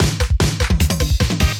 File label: test jingle